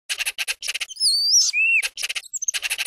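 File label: laughs; Star Wars Knights Of The Old Republic II; XBOX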